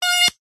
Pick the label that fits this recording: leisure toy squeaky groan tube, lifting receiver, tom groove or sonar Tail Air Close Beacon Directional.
leisure toy squeaky groan tube